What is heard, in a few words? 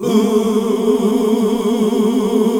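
Gospel; Best Service Gigapack 1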